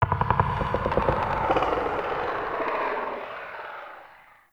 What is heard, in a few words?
Root Cave Trippy Knock; Ambience; One Offs; Neathuns Root Cave